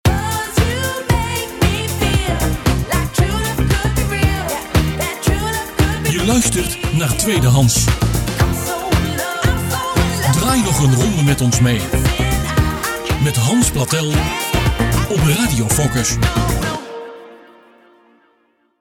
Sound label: Jingle; TweedeHans